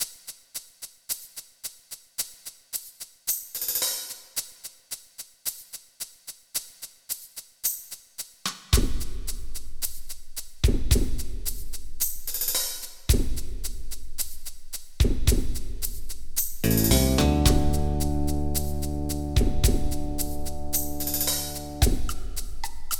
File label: rhythm; FF7DEMO